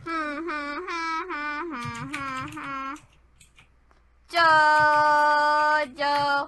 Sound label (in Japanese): Huhuhuhu~ジョージョ！; ジョジョＯＰ